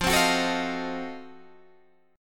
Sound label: F 7th Flat 9th F down Guitar Standard; F7b9 strummed